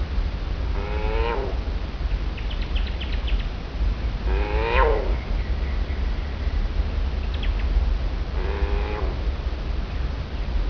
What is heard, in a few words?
lemur